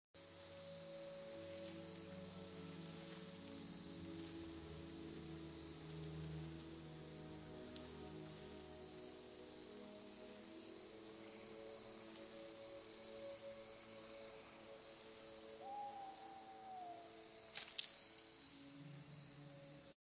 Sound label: game forest ambience